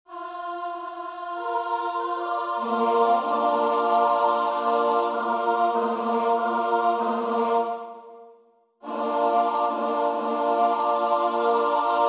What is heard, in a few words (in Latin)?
Hodie, Christus Natus Est (S-A-T-B); Willan (Healey)